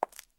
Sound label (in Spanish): Zapato tacon cemento; Yesterday Origins; PS4; macOS